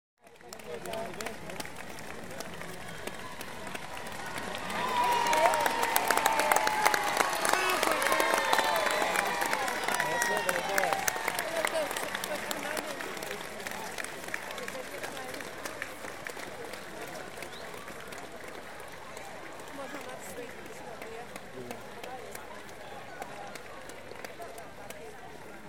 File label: Street Crowds - London Marathon, Cheers And Applause; Human Crowds, Children & Footsteps